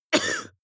cough male single short; soundpool

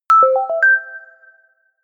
feedback positive jingle

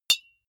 deodorant hit, metal; soundpool

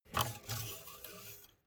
car bmw e46 exterior oil rod pull out

